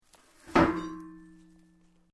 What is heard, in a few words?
METAL GIRDIR MOVE; Materials